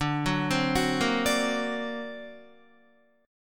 D Augmented 7th D down Guitar; D+7 arpeggiated; JGuitar

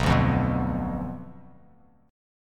B Diminished B down Bass Standard; Bdim strummed

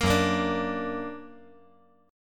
F sharp Augmented F sharp; F#+ strummed

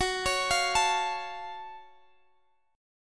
F sharp Minor Major 7th; chordshape; down

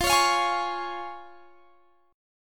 F Minor Sharp 5th F down; strummed

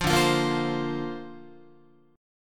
D-Minor 7th Sharp 5th D Guitar, chord; Dm7#5; strummed

